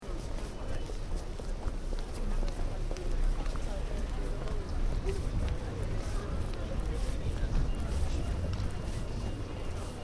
Town (Small) Ambience, People, Slight Traffic In Background; Outdoors; Backgrounds; Outdoor Sound Effects; Stuart Duffield